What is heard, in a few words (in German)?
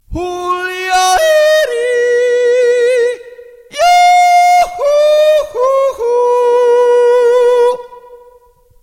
Jodler